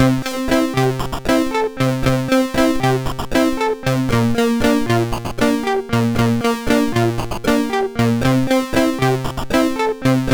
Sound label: Little Song, sid MOS6581R4